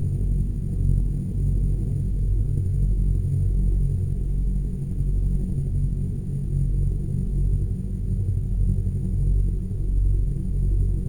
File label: Ambient Horror; MiSide